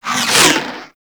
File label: Ghoul Expired Grenade Launch Whoosh; Warframe; Grineer Ghouls